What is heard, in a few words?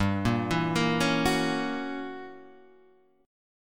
F sharp 7th G, chord; arpeggiated; JGuitar